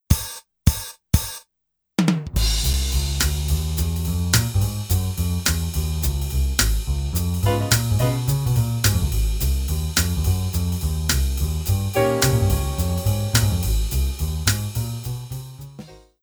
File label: Jazz